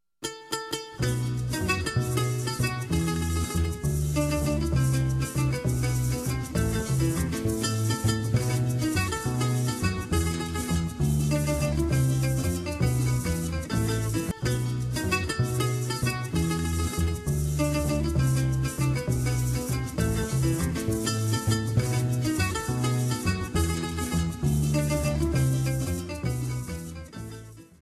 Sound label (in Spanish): VENEZUELA Pajarillo verde